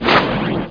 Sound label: MISSL